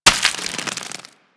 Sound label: Devour Enemy Bite; Warframe; Mummy